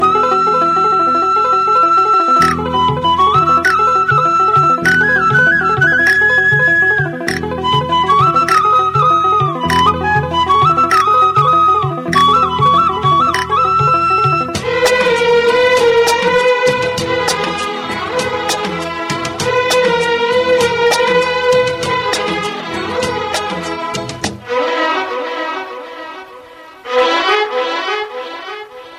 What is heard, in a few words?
flute